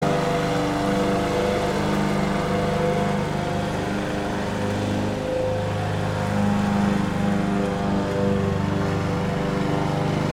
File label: mower